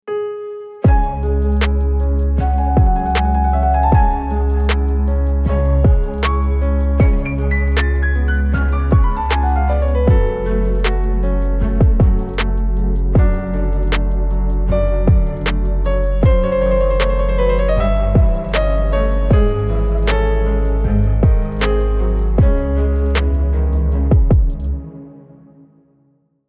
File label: epic background orchestral instrumental music